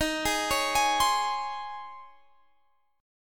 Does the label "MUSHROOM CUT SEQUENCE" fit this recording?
No